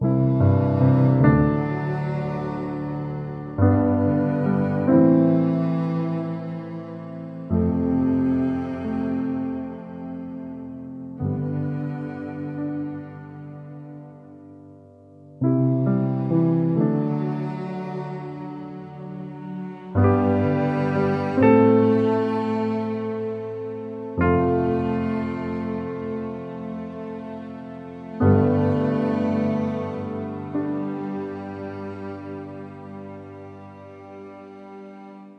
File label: piano, Sad Loops